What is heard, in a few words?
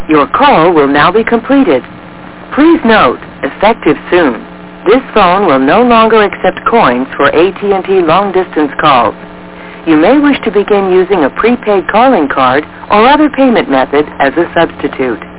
red box, tones; redboxatt; 2600; phreaks and geeks